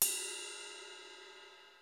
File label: YAMAHA RY30; Rubber Chicken Software Sample Bank